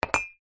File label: household mug cup ceramic set down on tiled table